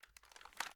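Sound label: sm reload movement; gmod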